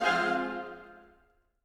Pick And Click Rose; netent

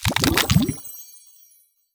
wild From Cluster; netent

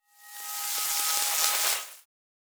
particle start; netent

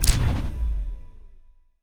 quick Stop; netent

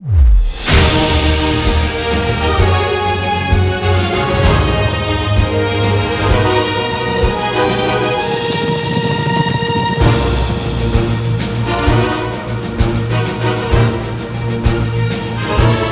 Big Win Long; netent

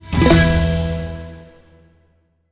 Soft Win; netent

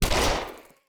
Gun Shot; police Spins; netent

